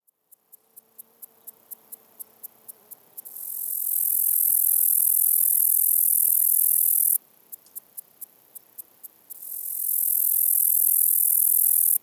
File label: cicadas; ambient; Counter Strike Model Pack; CS:GO; survival